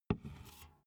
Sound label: big can filled put down hard